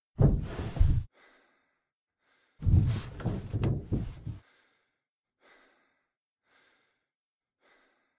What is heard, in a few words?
Crawl Sound